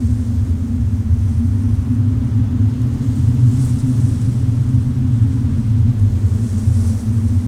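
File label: upper catwalk ambience; hl2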